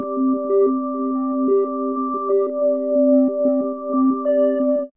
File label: SOFT FIFHT, DRONES & PADS; 90's Sample CDs